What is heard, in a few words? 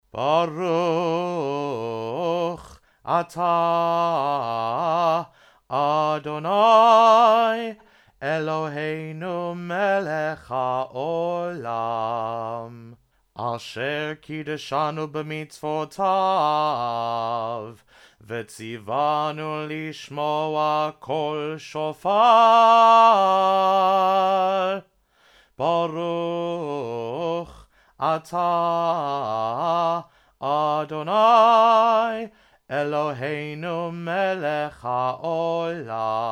Shofar Blessings; New London Synagogue